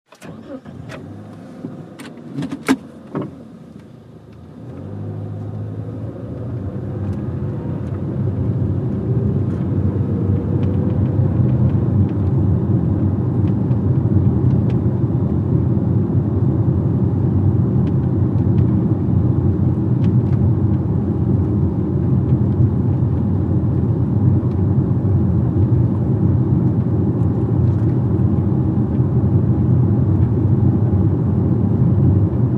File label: Sedan Eng Accel Stop